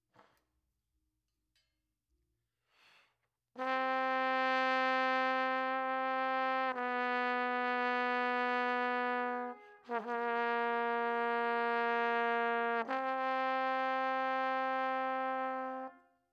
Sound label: Trombone